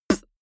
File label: beatbox snare punchy short